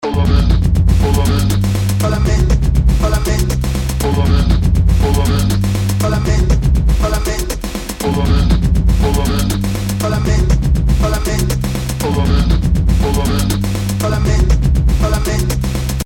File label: warbled beats